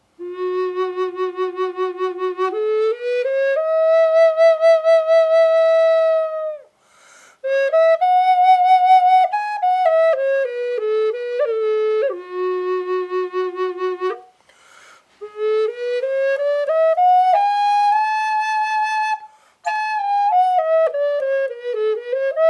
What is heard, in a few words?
F-sharp Port Orford cedar Dry, flutes